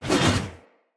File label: One Handed Axe Elite Whoosh Heavy; Weapons; Enemy; Axes; Sinecure One Handed Axe Elite